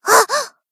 run; svencoop; hl Koyuki

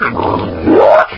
poison crab talk; svencoop; hl2

